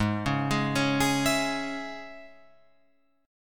Ab Suspended 4th Sharp 5th, Guitar Open F; Absus4#5 arpeggiated; JGuitar

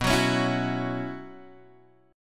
B Suspended 2nd Suspended 4th, Guitar, Open G

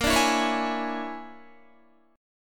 A sharp 7th Sharp 9th Flat 5th, down, Guitar; strummed; chord; JGuitar

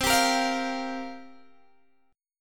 C 7th Suspended 4th, Guitar, Open G

